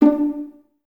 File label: Violas D 4 Pizz; Miroslav Vitous; String Ensembles